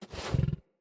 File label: Kubrow Tame Interact Sleep Sniff Lay Down